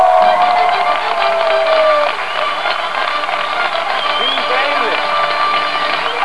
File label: Car win fanfare